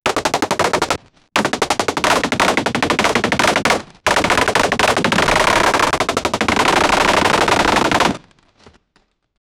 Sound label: rapid fire arrows shootin; bow and arrow